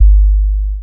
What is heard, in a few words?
DeepSeaBass G; Zero-G; Total Drum Bass; Basses